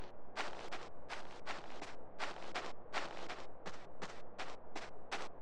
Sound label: Surface sand